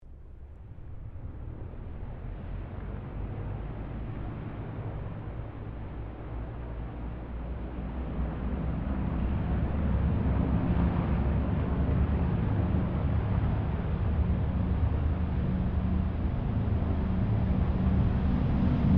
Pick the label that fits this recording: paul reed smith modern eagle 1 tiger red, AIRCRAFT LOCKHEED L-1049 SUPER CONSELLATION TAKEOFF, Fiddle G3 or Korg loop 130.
AIRCRAFT LOCKHEED L-1049 SUPER CONSELLATION TAKEOFF